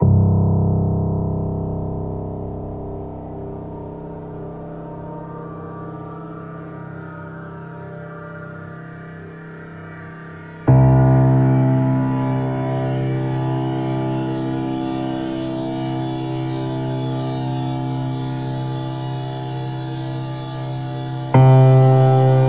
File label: Instrumental Version